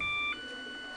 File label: IBIS pieper error, volvo 9900; Freyfurt Beta